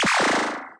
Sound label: fire, video games